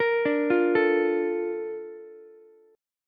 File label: A sharp Major 7th, Ukulele; A# M7 arpeggiated